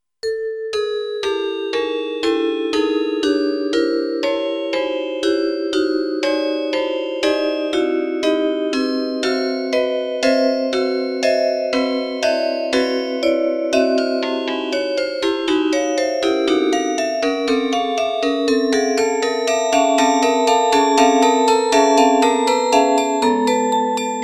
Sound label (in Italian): arco